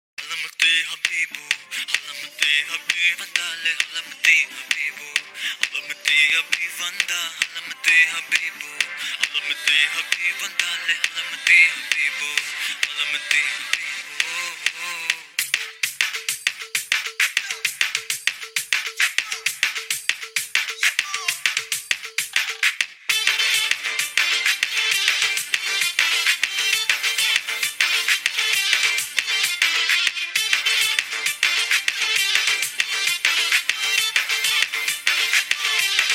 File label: Tamil Ringtone; Beast